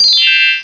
whistle down chime

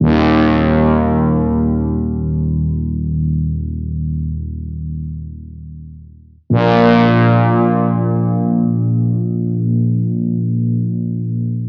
fake tubas, Electronic Loops

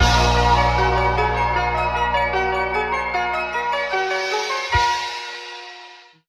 keys

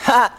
"ha", male; ooh ahh

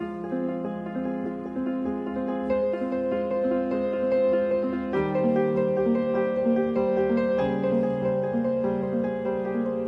piano, Loops Sad

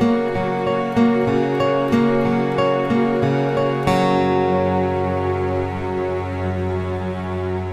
guitar ruined puzzle, Sad; Loops

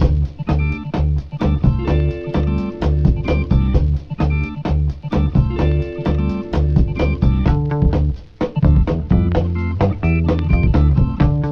full, Loops Phonky

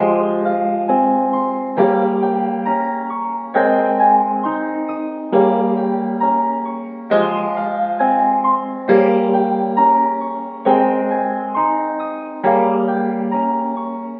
piano, sad loop

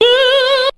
"ooh" SUNG

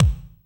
Kicks Electro

